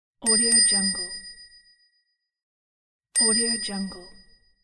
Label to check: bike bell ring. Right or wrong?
Right